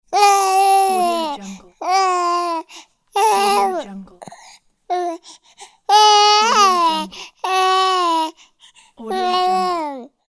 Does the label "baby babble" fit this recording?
Yes